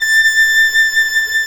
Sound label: FIDDLE NOTES, A6, L